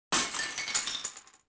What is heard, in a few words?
tinkle, tavern; AMBIENT; Pirates Of The Caribbean